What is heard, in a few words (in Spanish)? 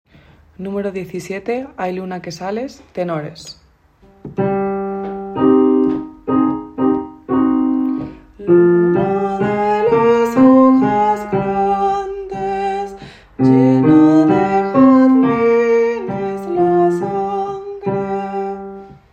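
luna que sales tenor; sop, alt, ten, baj; nº17. Ay, luna que sales; Bodas de Sangre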